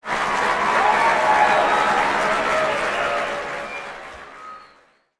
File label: large crowd applause